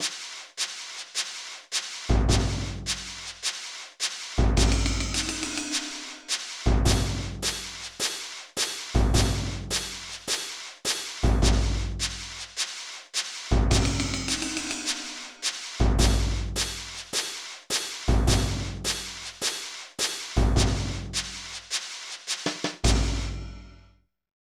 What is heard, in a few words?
Drum Practice Music; Nintendo; The Legend of Zelda Majora's Mask